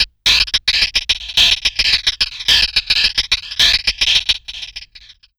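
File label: RAZE hi-hat; Big Fish Audio; Uncivilised G'rooves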